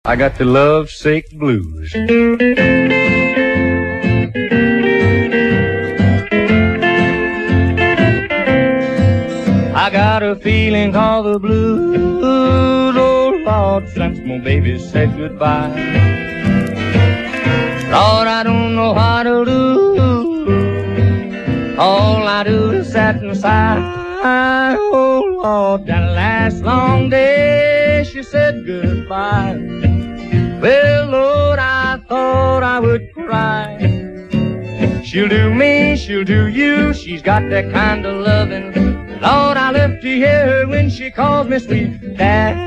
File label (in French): Chanson blues